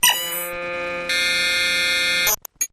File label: Beeper Interference